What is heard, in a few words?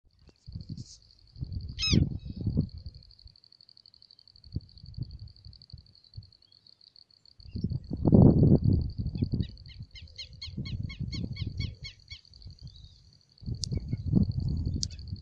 falcipes